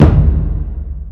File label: normal hitnormal; osu!